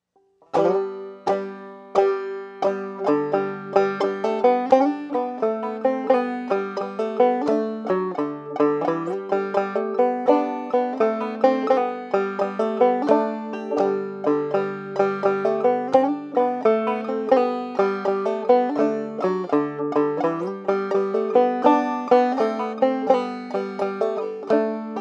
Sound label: fast; Christmas